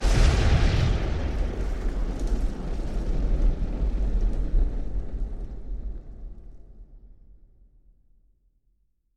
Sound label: Thunder; soundscrate